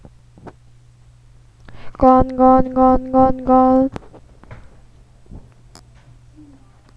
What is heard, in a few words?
gun